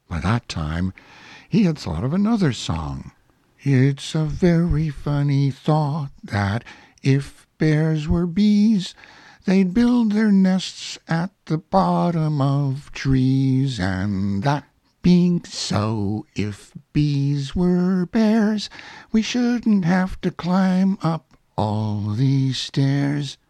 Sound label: song